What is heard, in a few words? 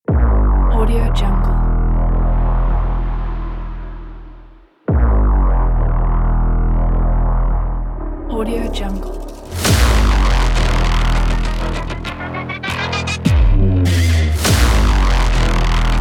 aggressive action trailer; soundbay